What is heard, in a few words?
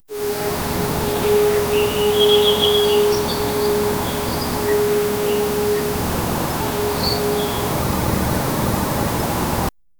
asmr evening quiet garden